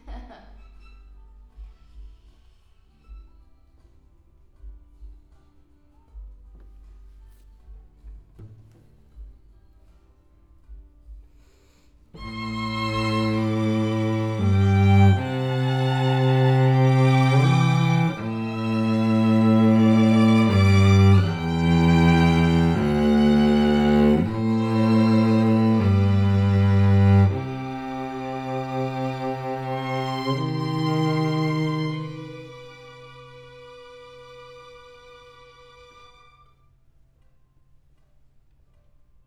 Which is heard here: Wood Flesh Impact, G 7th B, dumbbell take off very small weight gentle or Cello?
Cello